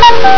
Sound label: CUCKOO